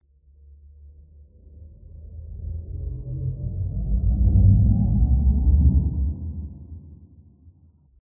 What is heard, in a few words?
Transition Liftoff; PMSFX